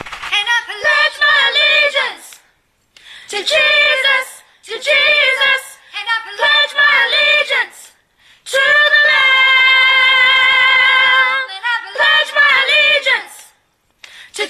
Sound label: baptize soprano